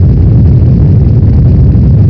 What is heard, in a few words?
engine; blitz